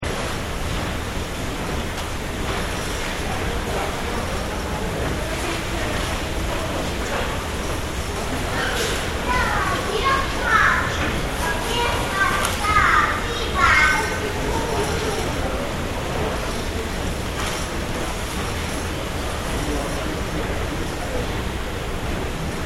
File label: Subway train roomtone; Train Station; Backgrounds; Yvette Jackson